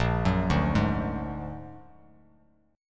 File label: A sharp Augmented 7th A sharp Bass Standard; A#+7 arpeggiated; JGuitar